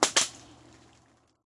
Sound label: Golf; Sfx Golf